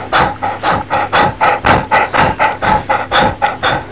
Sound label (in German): Hund